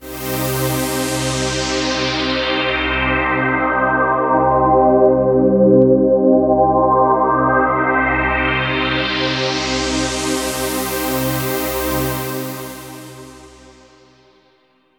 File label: SYNTH PAD AMBNT 160 C, Ambient; eJay; Sphere